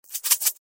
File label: Salt shaker shaking; cooking